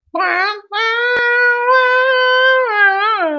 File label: Music Musical instrument Harmonica, vocal imitation